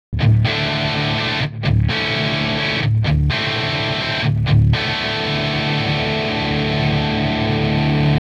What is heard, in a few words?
GUITAR MOD RIBBON 10K BOOST; Modified WA73-EQ Distorted Guitar 10k boost; Revive Audio